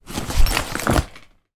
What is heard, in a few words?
suitcase open